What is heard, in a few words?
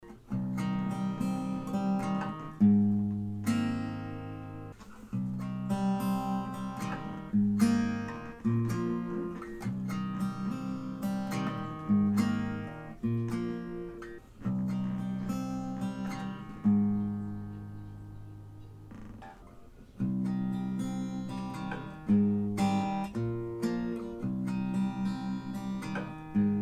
Melody Only